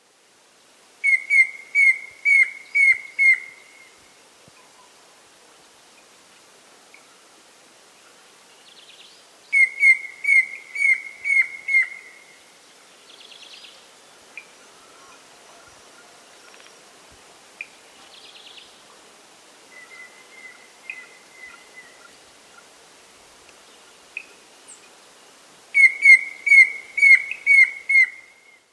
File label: Rufous Antpitta; S. America